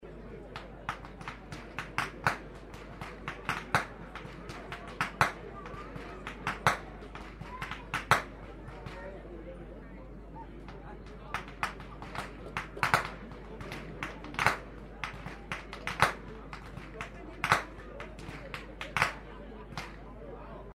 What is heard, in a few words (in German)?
schnalzen, jugend; waging